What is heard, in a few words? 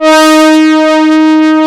MOOG BRAS, Moog Synths; E-MU Formula 4000 Series Vol. 3 – Analog Odyssey